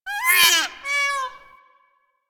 Forest Bird Cries; Warframe; Ambience; Grineer Forest

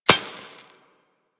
Laser Impact Metal; Surface

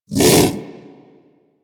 Predasite Vocal Bark; Warframe; Hunting Critters

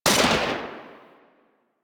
Artillery Mech; Warframe; Vehicles; NechroMech; Abilities

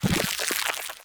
Sludge Damage Proc; Procs

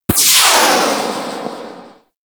RPG Rocket; Hellion Elite

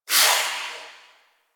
Wisp Overwhelm; Warframe

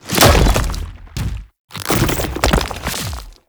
Man In The Wall Biped Entry, Enemies; Warframe

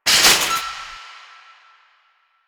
Shopping Cart Hit

